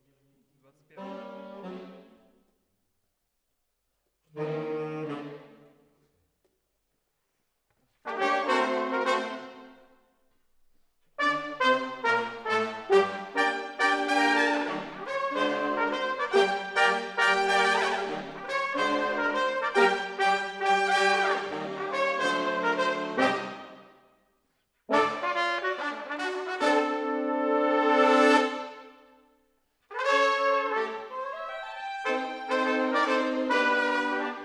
Horns Over

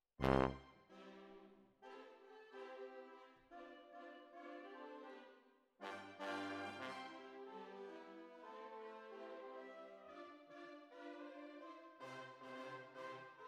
Tuba, jazz for piano

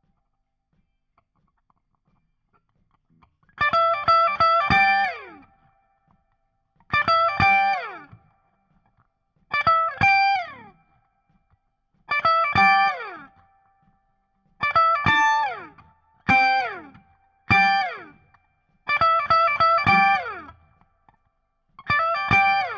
Guit Solo Back

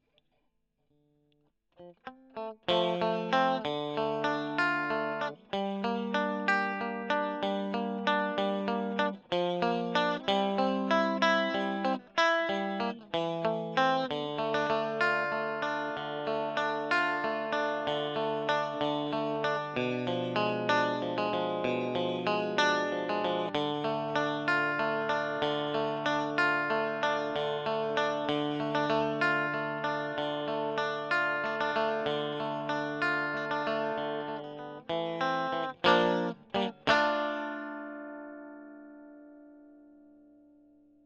E.GTR Gefell

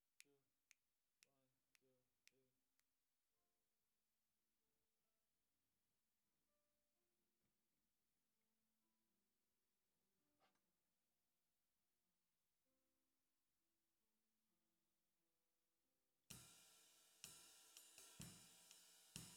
SNR

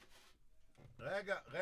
Drums

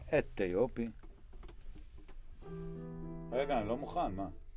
C Bass Bot

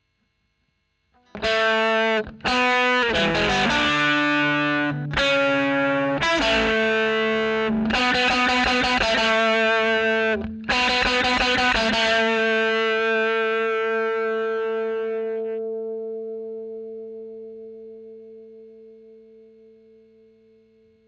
E. GTR Royer OD

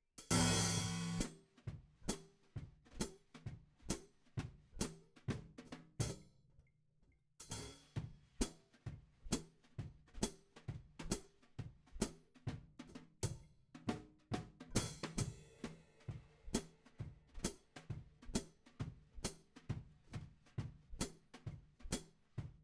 Hat

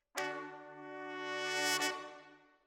Sax

